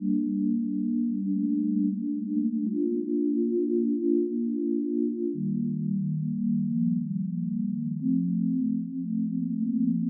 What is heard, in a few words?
Pad, Gm, 90 BPM